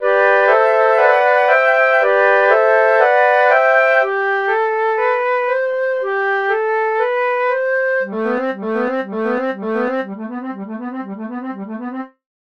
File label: Flute, fades; mimic NI2